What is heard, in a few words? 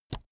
keyboard laptop space push soft